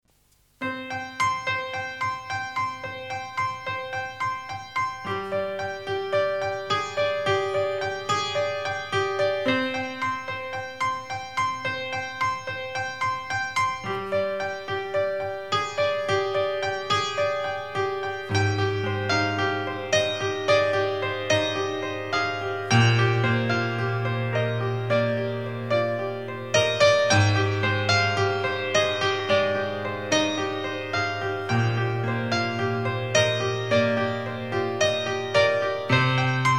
piano solo

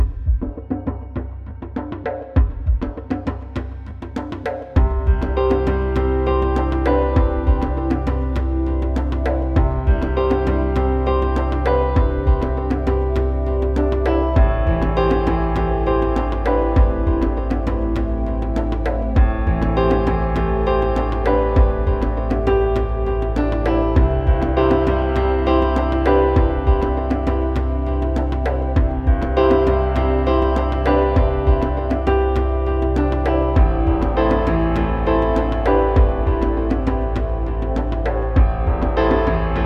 rainy day percussion chill mix

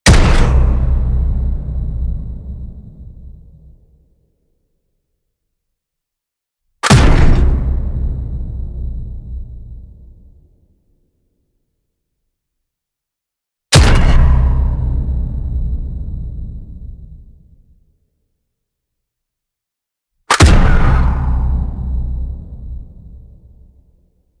hit pistol whip

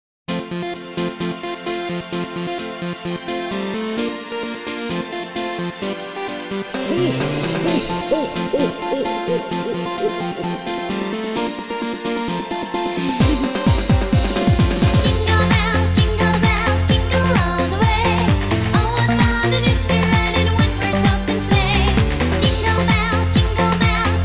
jingle bells

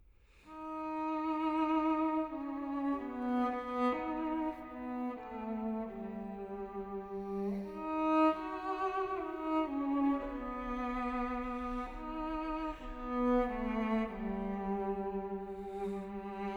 suite for solo cello, canto con moto; benjamin britten

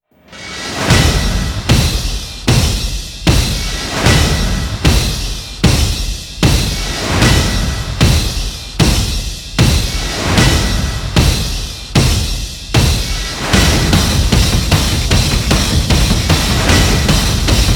drums

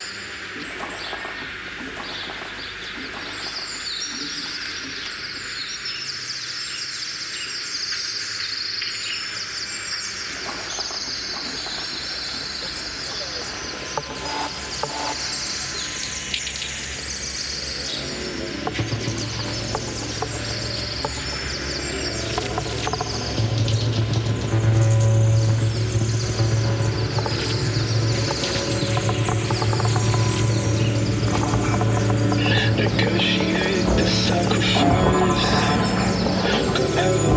field modulator Trafalgar Square field recs

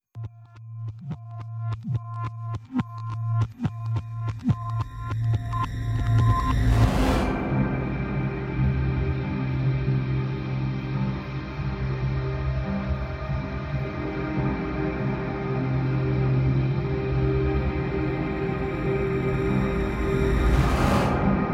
pads and pulses

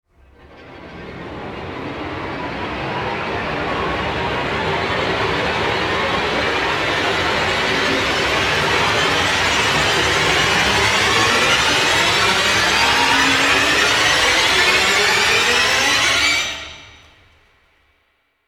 rise

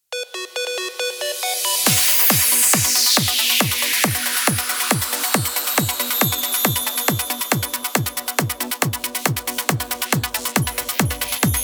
uplifting mix

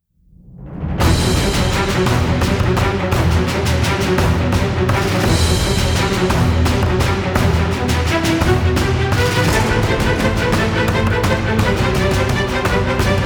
no choir

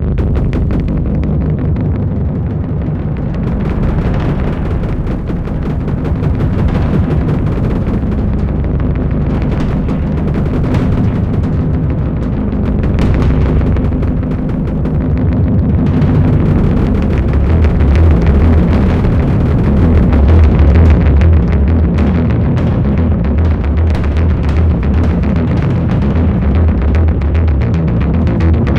bass drum wave

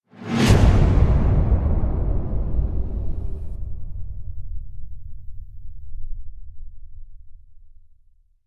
booms abyss with whoosh

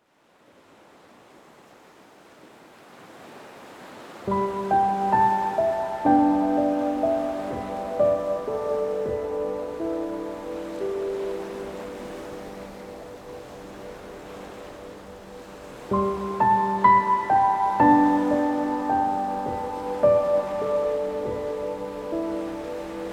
piano, healing rest and ocean waves

